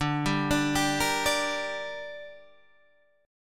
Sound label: D Suspended 4th Sharp 5th; 10,10,12,12,11,10; arpeggiated